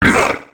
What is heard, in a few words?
HUSK damage